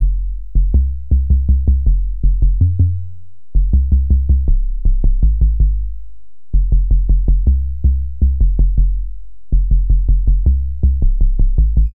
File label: bass, Drum & Bass; Best Service ProSamples vol.03 - Drum & Bass 1; AKAI